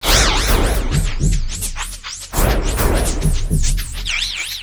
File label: PLAS FX, Industrial Loops; USB Soundscan vol.24; AKAI